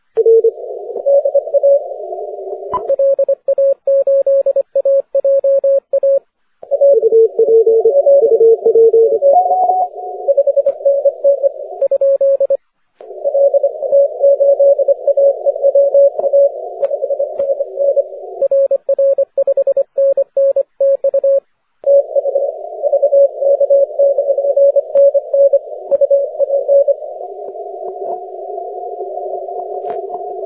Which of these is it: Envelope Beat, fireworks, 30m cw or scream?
30m cw